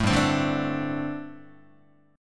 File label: G Suspended 2nd Suspended 4th, Guitar